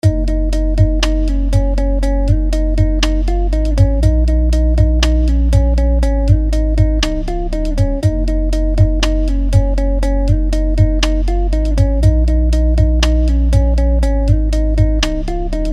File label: Balance 3dB One Band; PanShaper